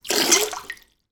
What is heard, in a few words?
toy duck squeeze out water